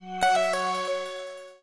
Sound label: battery low